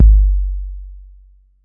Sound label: BD 808